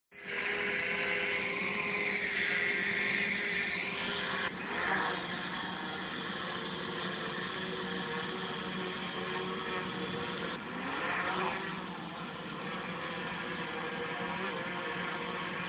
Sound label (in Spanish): sonido dron